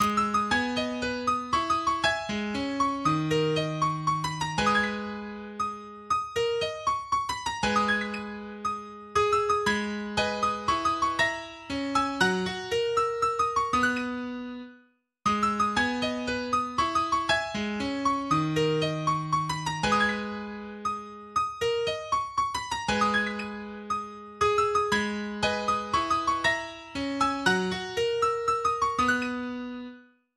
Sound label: lullaby